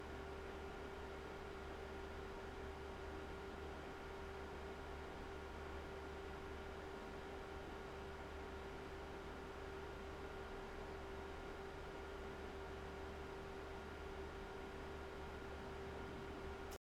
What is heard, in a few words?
Room Ambience Fan Low